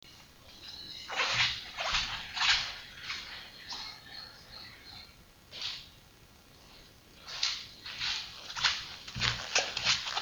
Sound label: steps rubber soles on vinyl